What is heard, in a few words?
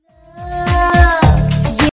Baby